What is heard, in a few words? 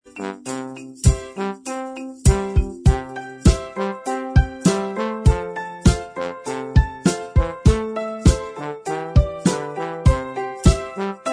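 instrumental, backing tracks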